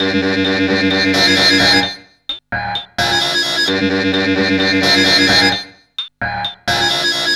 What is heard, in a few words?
Ugly, Industrial, Nasty